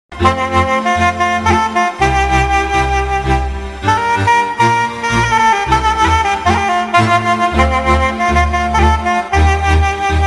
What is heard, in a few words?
Romanian Folklor